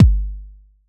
kick machine

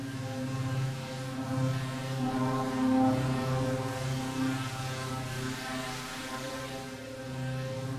Drone; stereo toolkit samples; Non Tempo Loops

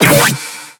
BASS SHOT

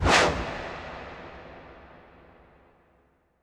Impact Wipe, Cinematic

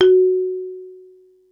mallets

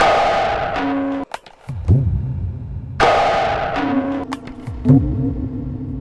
tabla, 80 bpm; Zero-G; Pure Tabla

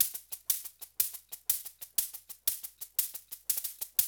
World Dance; OZ!

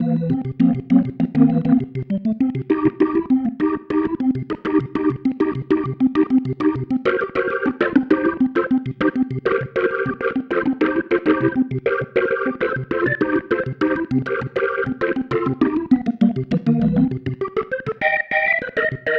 Pattern, Organ

